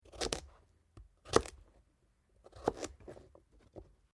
Carrot slice; Food preparation; Sound Effects:Restaurants

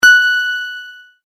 multimedia tone correct; McKinney Sound

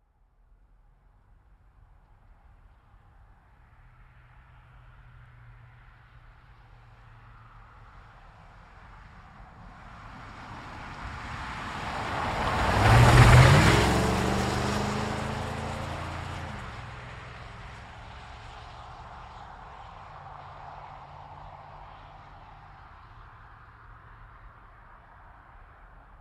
Truck GMC Savana By Corner Fast; Vehicles

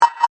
Multimedia Beep, Technology, Multimedia, Beep; Beeps; BlastWave

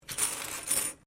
Coin Penny Multiple Move Wood

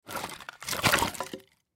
Fire Wood Logs Pile Pick Up; Fireplaces; Foley; Blastwave

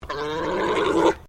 Animal Alpaca Male Mating Call; Alpacas on a farm in Gippsland Victoria; Miscellaneous Animal

